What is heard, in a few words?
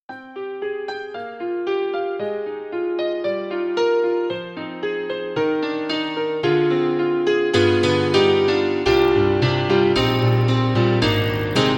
Piano; KORG; KRONOS 2